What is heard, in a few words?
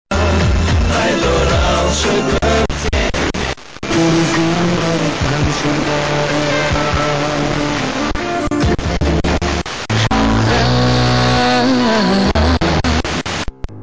85 110 MHz